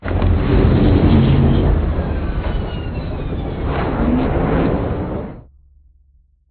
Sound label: strafe close; Beacon; Titanfall 2